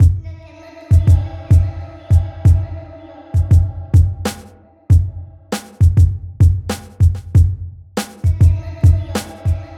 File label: DRUM